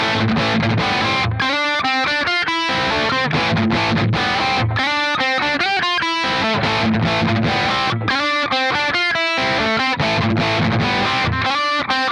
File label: HIGAIN FX; interface test; iD14MK2